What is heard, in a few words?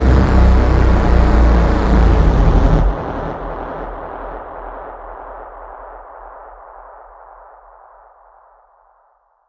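Blast Plummet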